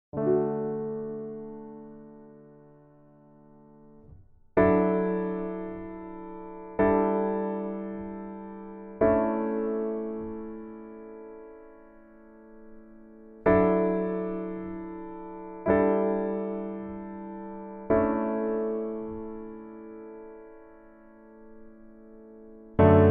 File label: Piano